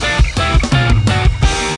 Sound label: Exiting Vehicle Jingle; The Simpsons Hit & Run; PS2; Fresh Skid Marks